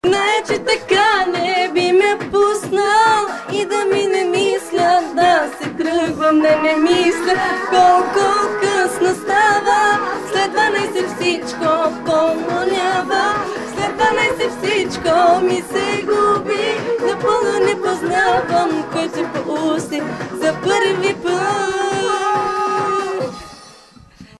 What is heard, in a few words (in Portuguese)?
cantar